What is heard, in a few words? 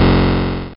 Manglerhit; tempest 4000